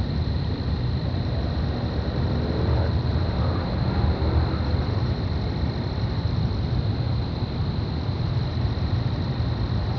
STREET; Gothos

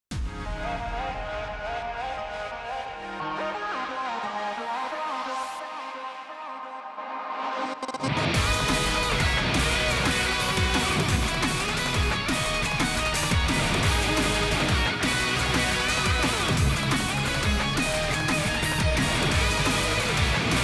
Battle Boss; Type Elsie 2nd

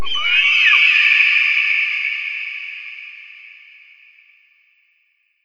Elementaliste Skill Eagle; Legend of Keepers

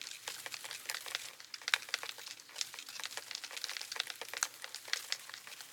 Trader Loop Leaves

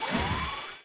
Drill Attacking

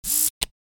Multiverse button; Mechs

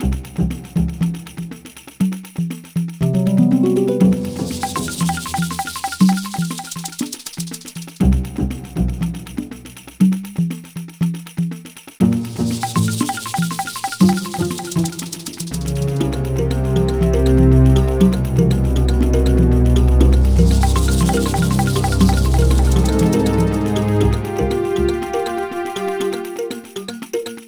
music ruins, bpm120

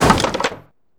Woodenbox

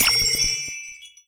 Small Cast

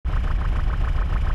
Walk; starbites

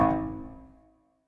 warning

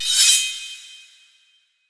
Skill Sword; Berserk

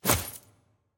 Item Buy

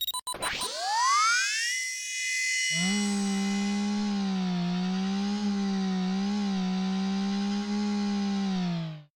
spiderboss attack center

